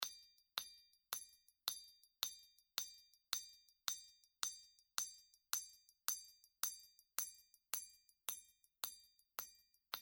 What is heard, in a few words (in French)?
Bris, binaural